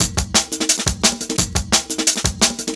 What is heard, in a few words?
DNB BREAK